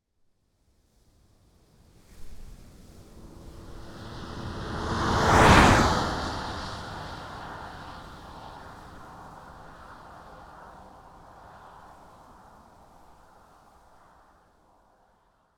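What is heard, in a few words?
Volkswagen Caravelle T5 2.0 TDI exterior passby constant rpm 120kmph; stereo AB; Soundholder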